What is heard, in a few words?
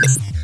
computer